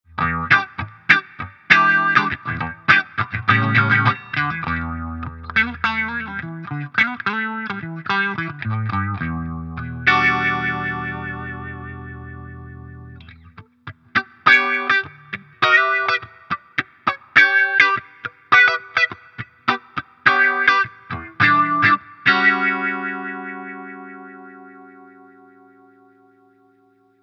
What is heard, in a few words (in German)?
Git normal Cutoff und Reso 12 h Speed 3 h